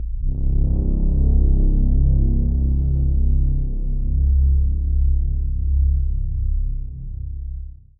Steampipe Ghostwhistle C2

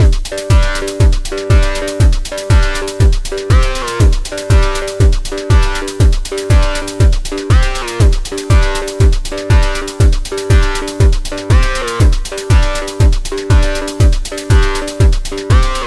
Techno Blues Loop

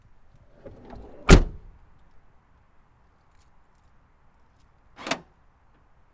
Slam And Lock The Car Door

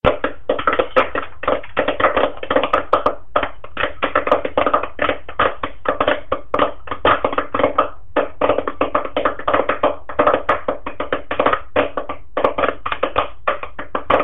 Popcorn

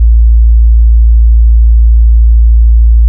Real Subbass

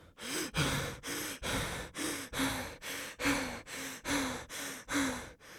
Breathing Of Someone Scared

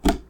Wood Grab

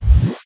Air Sweep Transition

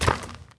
Dirt Gravel Footstep

